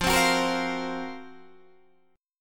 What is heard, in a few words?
F sharp Major 7th Flat 5th F